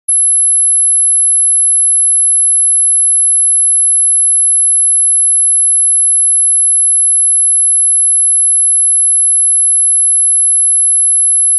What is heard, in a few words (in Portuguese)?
Som Agudo Anti-Cães; toques para celular